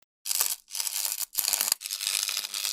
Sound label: Electric sound